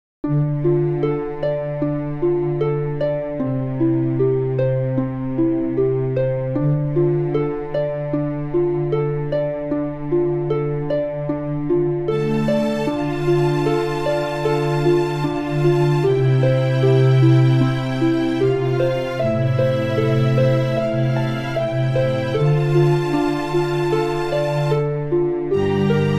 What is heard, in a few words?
backing; backing tracks